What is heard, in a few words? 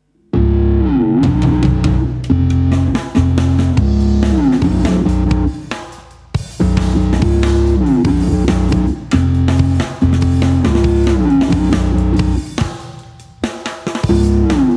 drum n bass thing